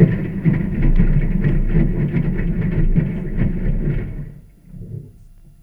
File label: Washing Machine, contact mic, mechanical; musicradar; contact mic madness; Raw